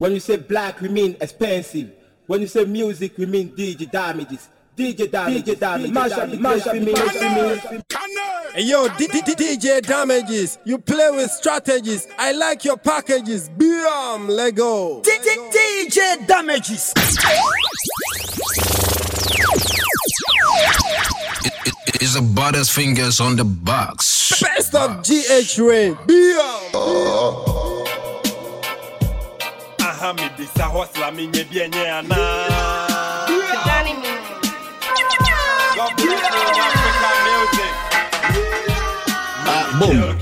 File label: Rain